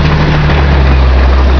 bus ambience

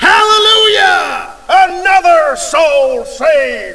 hallelujah

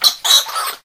tushkano panic

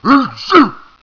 zombie attack; zombie soldier

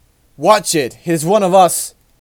shot

